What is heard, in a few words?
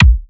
acousticy kick